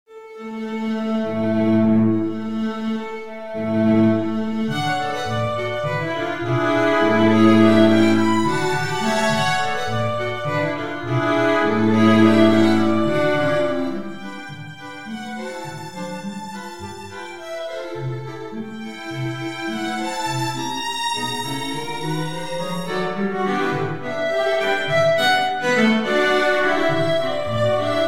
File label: string quartet